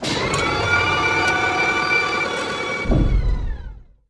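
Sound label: ship cargo transfer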